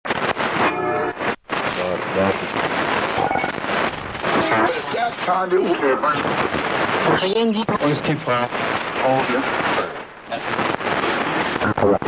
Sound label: Tuning Am Short Wave, static